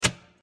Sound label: Key ON; Granny 3; Android